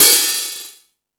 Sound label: Ambient Rock, Drum Machines